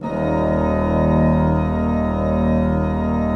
PLENO D1, Cathedral Organ; Propeller Island

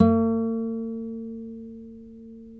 NYL NV B, nylon no vib guitars; Spectrasonics; Hans Zimmer Guitars VOL-1

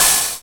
drm a#1, DRUMCOMPUTER, KORG DDD-1; Retro Sampler

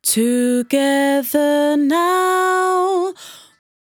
100bpm Bm, Velvet Vocal; Zero-G

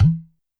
Udu High, YAMAHA RM1X

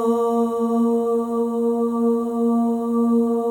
OH, FEM, Symphony of Voices

